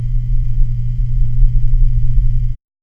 low whirring sound of; historic

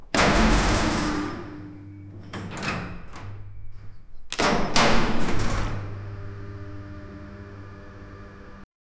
heavy mechanical lift, elevators

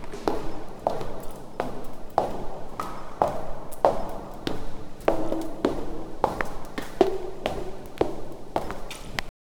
slow walking in high heel; cave

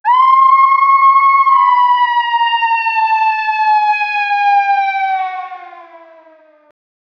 apocalypse nuclear attack

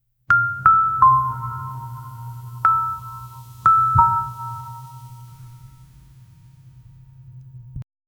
short audio logo soft sim

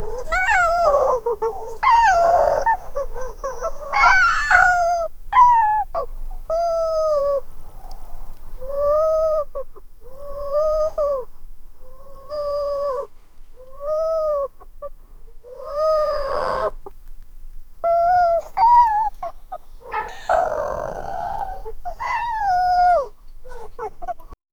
a fox pup is crying

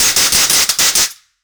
short dry and crispy high, bite

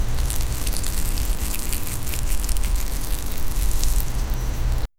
soft dry roll, fruit

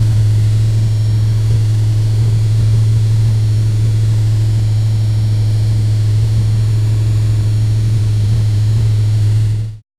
futuristic porsche w18 en, w18

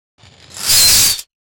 monster rope pull

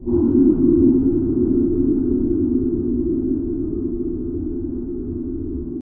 cold echo ambience

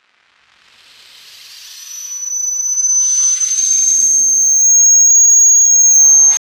sparking sound, electrical

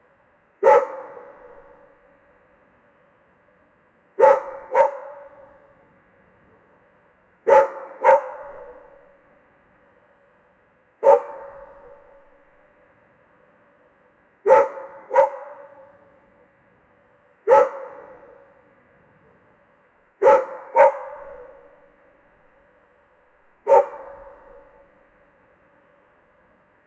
game audio

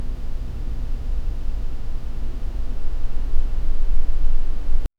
soft morning ambience fai, room tone

